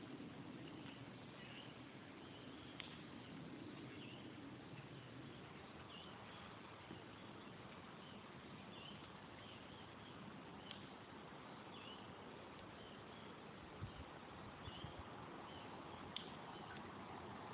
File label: popping noise